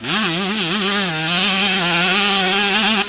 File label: power, Engine